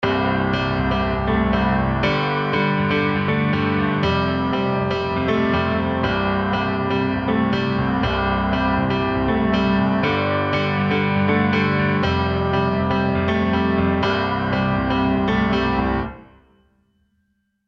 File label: EZkeys Studio Grand Synth Pop Studio